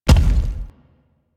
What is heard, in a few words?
Obelisk Stone Connect; Warframe; Infested Eris